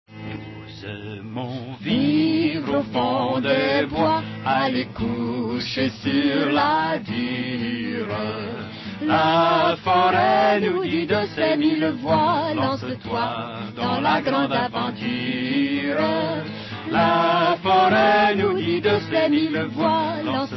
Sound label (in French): chant russe